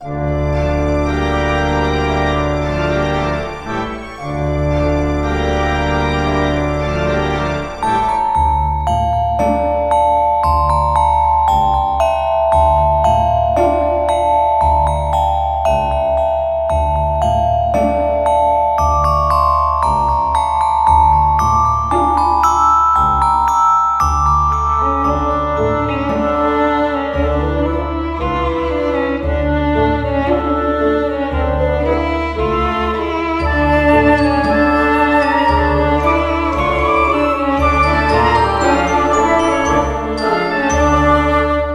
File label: halloween ui; Identity V